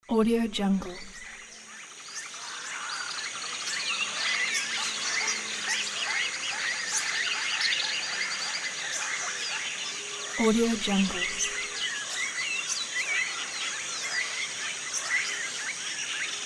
tropical forest

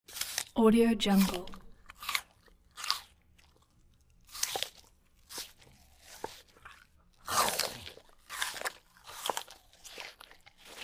biting an apple

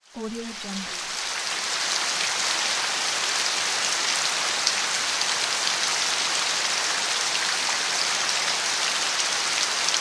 the rain

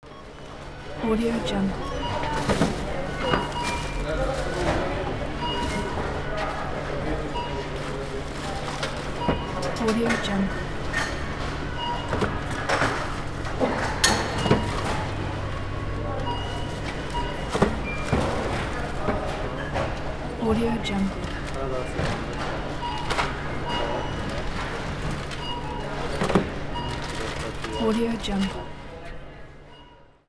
in the supermarket at the cash register

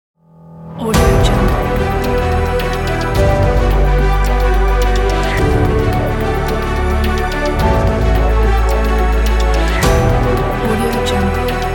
epic hybrid trailer action; uragrand2007

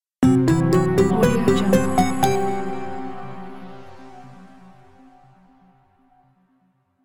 distant galaxy logo

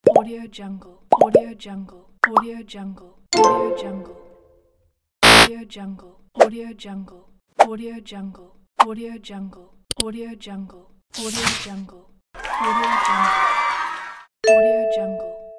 infographic explainer sfx pack

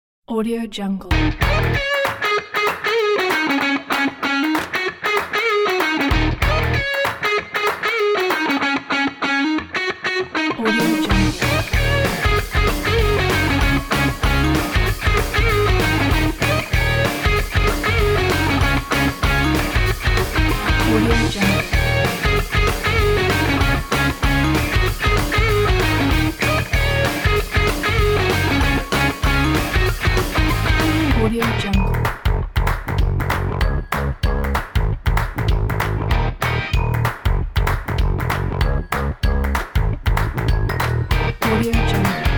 upbeat indie rock fun

